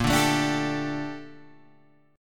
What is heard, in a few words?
A sharp Major 13th, Guitar Open G